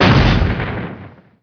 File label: impact meteor fall